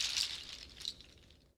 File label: Goathooves Loop, Percussion, Shakers; Roland BK-5